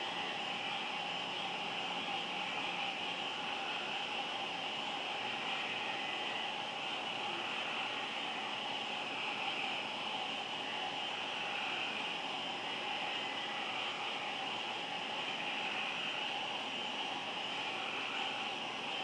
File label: frogs